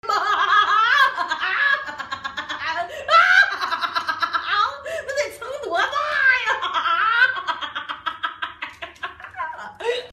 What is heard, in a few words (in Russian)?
smeh